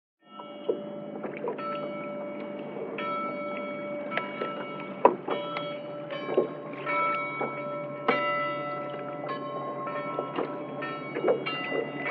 Bell rings, water sloshing, distant motor in background, harbors & marinas buoys